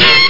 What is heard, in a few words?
em hit; bounce ball